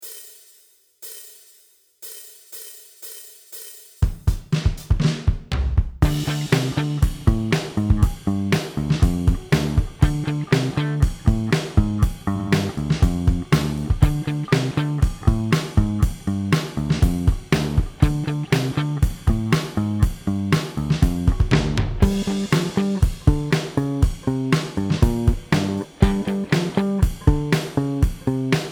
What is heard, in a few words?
Backing Track No Lead